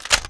mp5 bolt forward; firearms

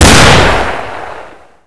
saiga12 fire; firearms

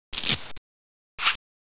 throw grenade; gearbox; demoman

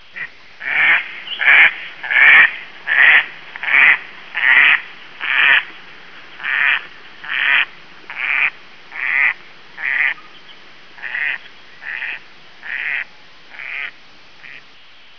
blackduck; firearms; balcome

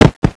zombie dog run

